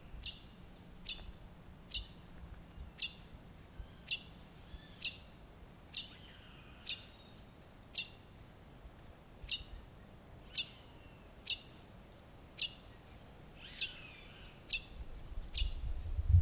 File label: Red tanager